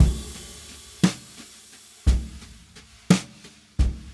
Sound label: Brushes SN SN